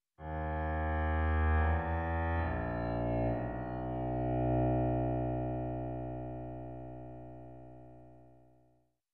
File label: Evil and low piano notes